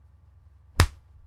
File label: body; 掌ぱーん